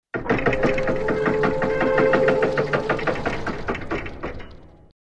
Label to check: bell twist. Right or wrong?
Wrong